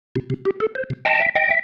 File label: Single Riff, Organ